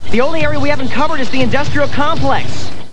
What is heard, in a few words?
indust